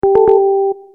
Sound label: messenger message received